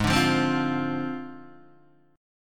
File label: G sharp 6th Flat 5th G sharp Guitar DADGAD